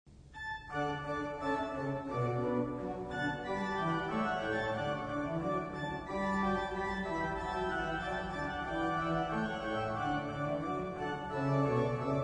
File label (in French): Trompette